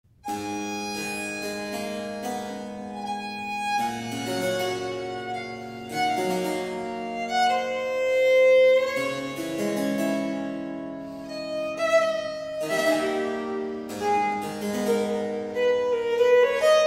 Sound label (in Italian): Sonata IV per il violino per sonar con due corde; Biagio Marini; NAWM